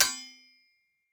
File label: sword strike; Rust